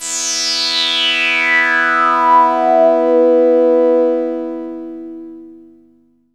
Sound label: RES FALL E3, RESO NOIS FALL; Best Service Alpha Dance vol.2